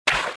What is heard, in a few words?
water run, footsteps